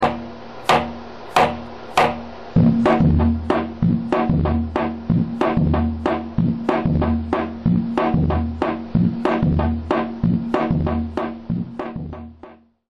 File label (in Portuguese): zabumba; instrumentos